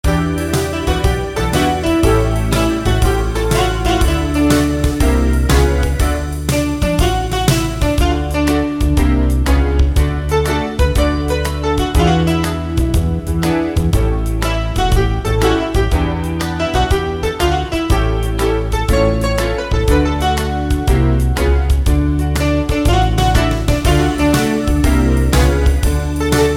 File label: Happy, Happy Birthday